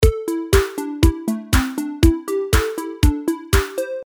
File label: delay